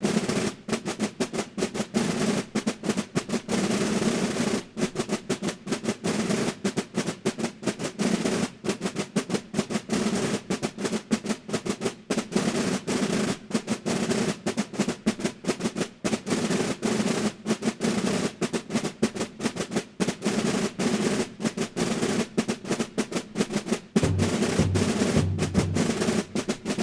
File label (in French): trompettes des cent gardes; Air de Gilles